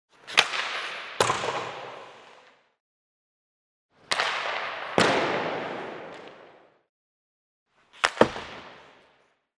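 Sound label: puck hits the boards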